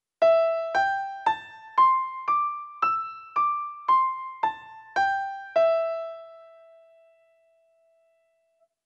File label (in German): Modus E der C Dur Pentatonik